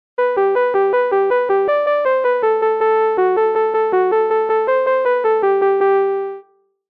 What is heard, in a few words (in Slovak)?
polka a kozipolka; dobra niva